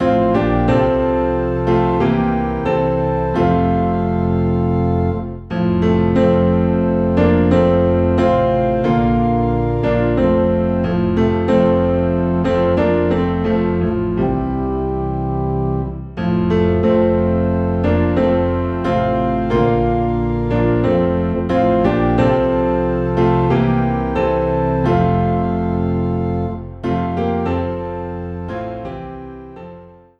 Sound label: Piano and Organ Low in F